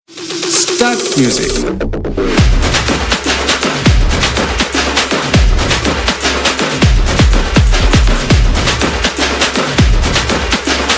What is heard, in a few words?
ringtone tamil bgm ringtone; the greatest of all time